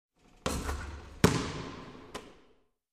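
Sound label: basketball ball into basket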